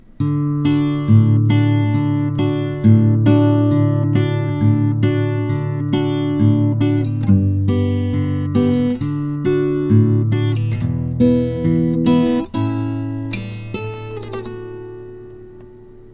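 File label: jingle bell arpeggio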